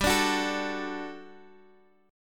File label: G Major 7th Suspended 2nd, Guitar, Open F; GM7sus2 strummed